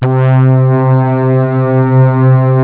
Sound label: low brass instrument; sonix; Stellar 7; chipmusic